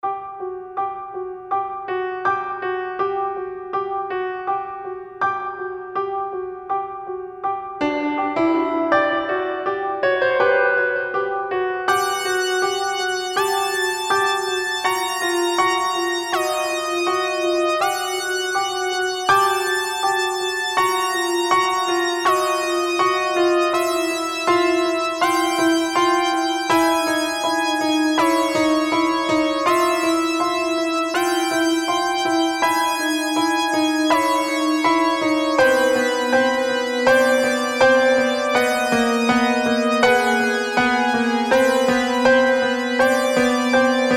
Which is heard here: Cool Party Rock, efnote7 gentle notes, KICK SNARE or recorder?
recorder